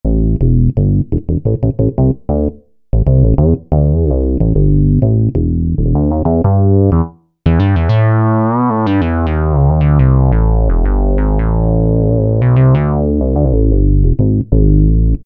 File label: Twin Bass, Alesis Micron; Mini Twin Bass; Factory Programs